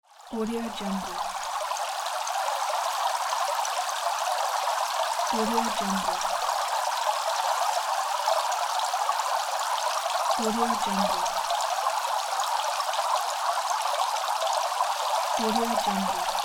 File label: fountain